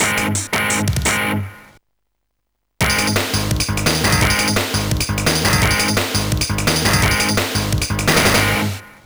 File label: kent mix, Dynamic Drums; Oakvalley